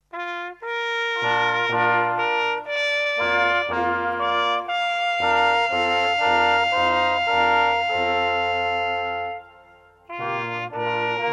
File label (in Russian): группа духувого оркестра; Молодежное общение г. Апшеронск